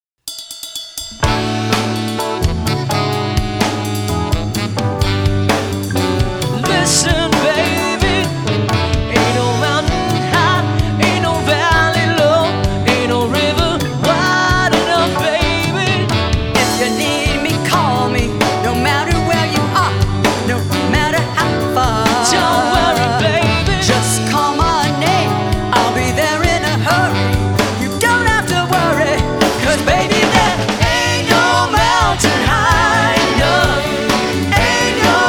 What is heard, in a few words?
Keys transition drop out